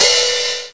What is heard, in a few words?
hh open; Atari Mega Archive - Volume 1; music